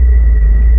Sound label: wraith windup; Stellaris; guardians